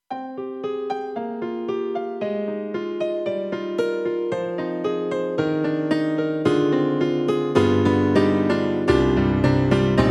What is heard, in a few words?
Pure Stereo Piano; M3 Version 1 Wave; KORG M3 XPanded